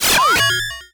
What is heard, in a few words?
Science Fiction Sci-Fi Electronic Ray Gun